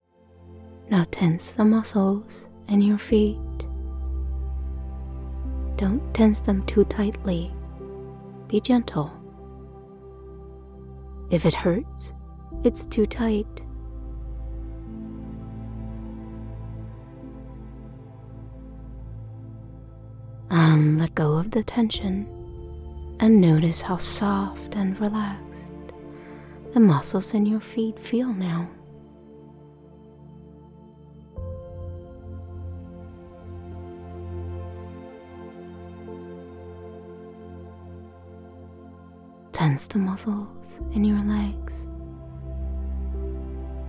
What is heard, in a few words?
Alternate Background Music; Progressive Muscle Relaxation for Children